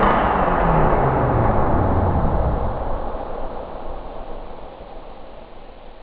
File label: olpex, explosio